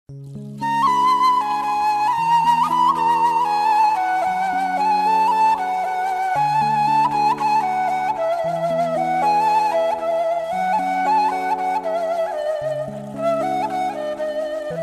flute

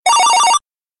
blackberry cell ringing

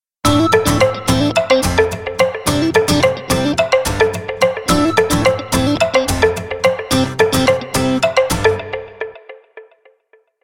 poco f1 stock tone

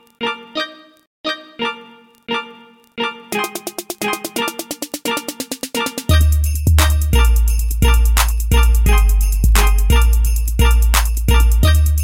new age xmas beat

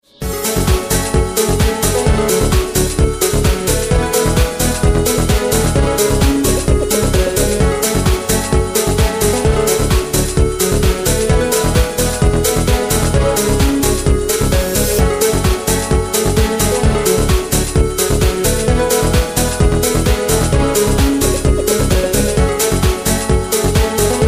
dance

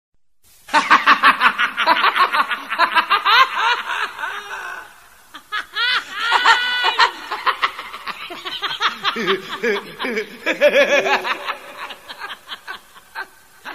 funny laugh

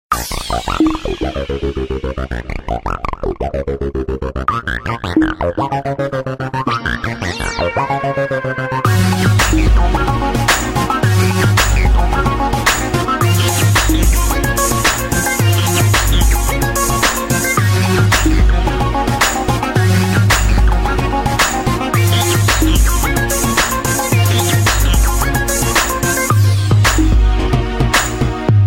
hot hip hop ringtone

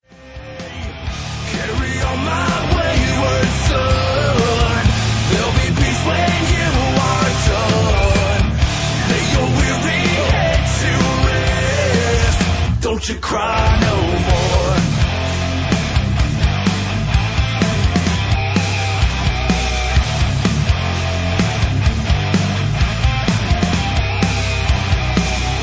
rock version

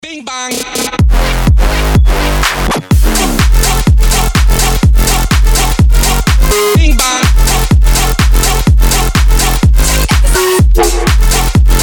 bing bong

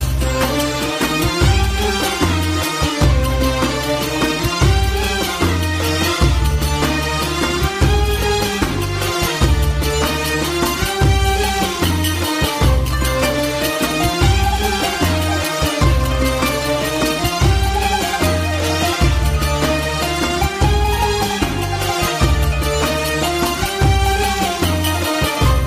oriental ring

